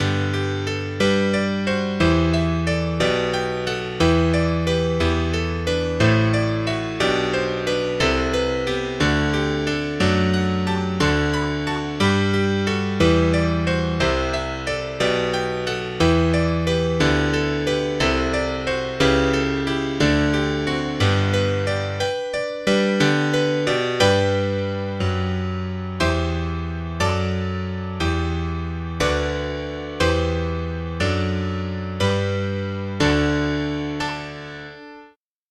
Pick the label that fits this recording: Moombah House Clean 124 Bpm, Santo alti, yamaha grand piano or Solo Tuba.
yamaha grand piano